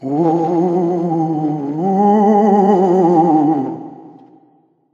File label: Ring Ghost; Microsoft; Windows Mobile ringtones; Ring Tone Pack 6